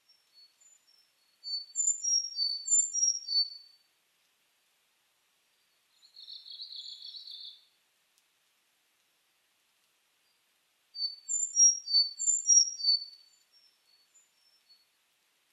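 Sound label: Sakhalin leaf warbler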